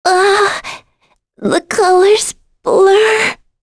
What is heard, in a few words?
Vox Dead; Talisha; kingsraid